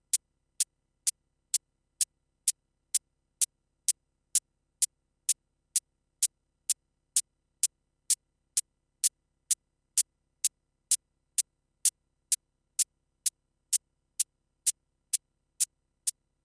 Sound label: TipTop Audio MA808